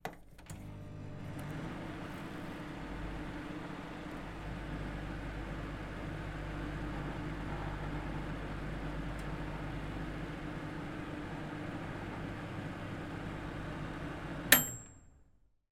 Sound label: household microwave oven operate ping finish